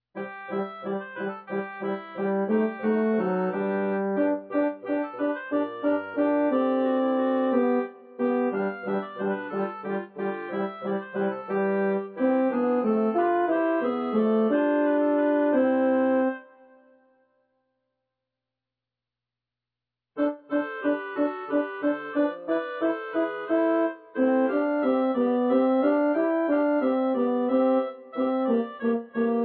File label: tenor